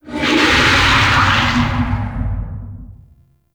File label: Kraken Vocal; Pirate; Warframe